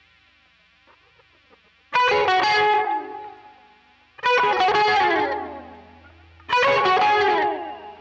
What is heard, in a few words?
quilter solo